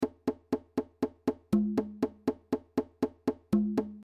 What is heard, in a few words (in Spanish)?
guajira bongos; reciclar tocar